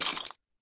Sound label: Obstacle Refrigerator; hello kitty friends match; android